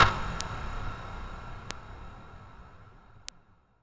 Shac Verb, One Shots Hit; Sonic Foundry (Sony Creative Software) - Future Retro Dance Excursions

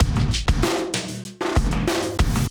Chemical, Drum Loops, Compressed; Zero-G Smoke

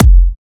Trance Kicks, Bassdrums

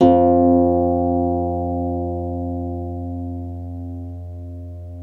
Troubador Harp

